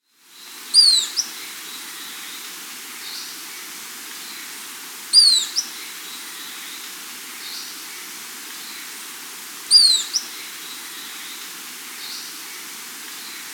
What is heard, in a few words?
Ruddy-tailed Flycatcher (Terenotriccus erythrurus), Peru; S. America